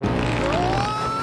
fart; captain underpants

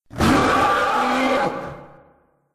scream

